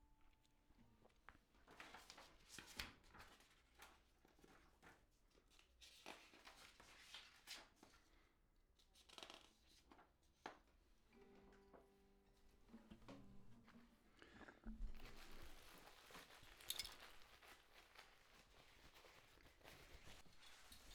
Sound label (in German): Nebengeraeusche